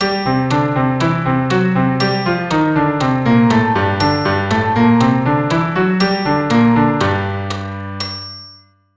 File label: Gm melodic